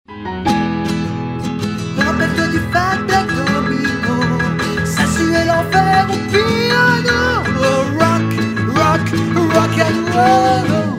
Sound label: Blues Rock Feeling